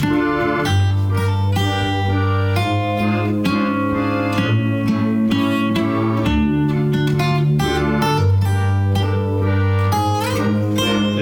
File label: Jam Session - Jamming on Interesting Chords; Sea Salt OST (2020)